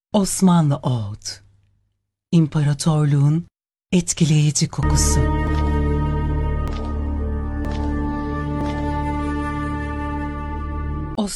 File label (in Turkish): osmanli oud